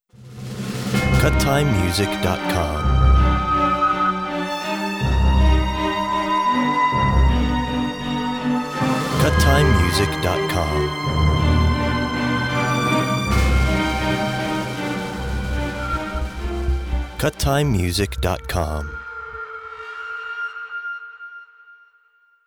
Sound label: orchestral